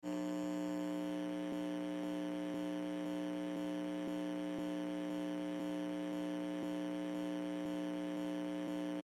hum noise

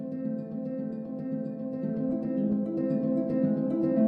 Harp pres de la table

